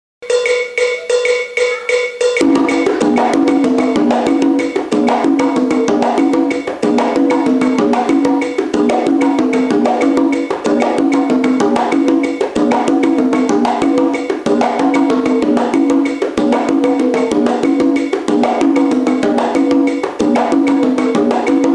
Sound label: abaqua var 3 and 4, congas; rhythms; Francisco Vega; PATTERNS 3&4